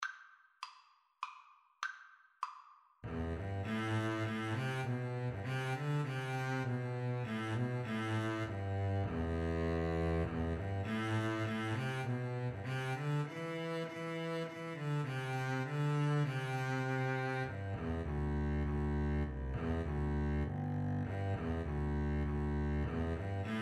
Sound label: Double Bass Duet